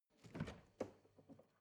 refrigerator door open

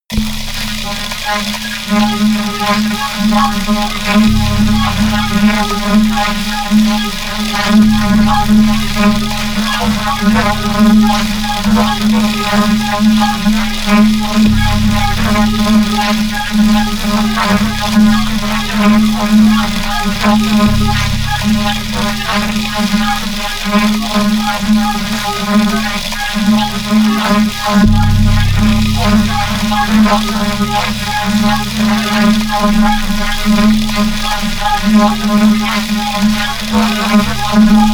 atmosphere crazy didgeridoo low

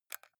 console controller xbox joystick press fast

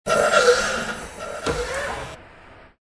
hydraulic door

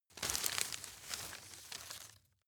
grab rustle medium

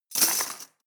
cutlery teaspoons drawer place hard

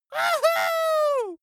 dwarf cheer "wuhu"

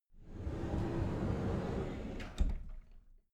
cupboard slide door open soft

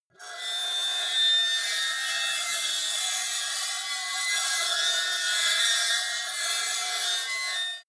metal lid screech

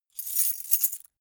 metal keys handling

